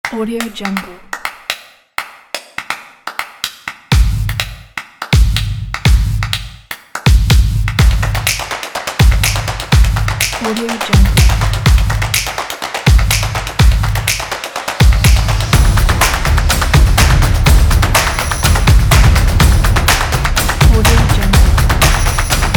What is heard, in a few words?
percussion